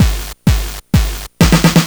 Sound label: Game Boy Combo Loop, 8-Bit, Drums